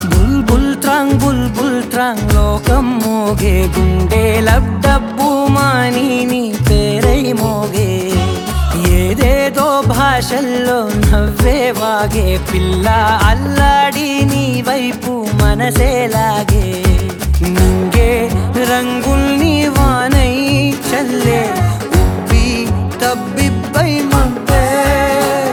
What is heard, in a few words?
bulbul tarang ringtone